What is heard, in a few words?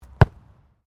RUGBY BALL KICK; Various rugby sounds; Miscellaneous Sports; Sound Effects:Sports